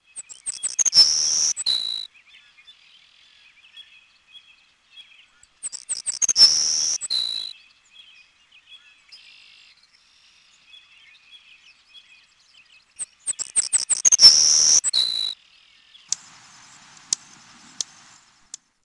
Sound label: savannah sparrow